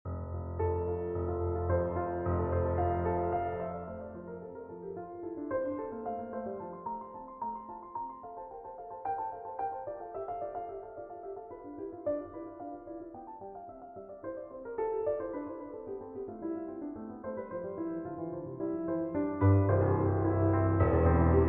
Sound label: Invention in F Major